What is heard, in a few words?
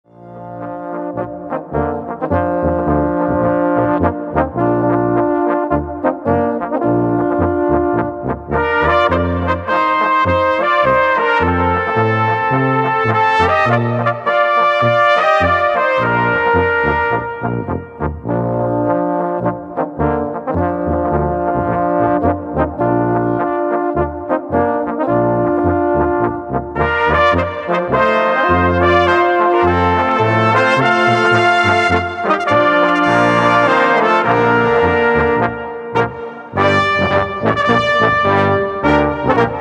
Polka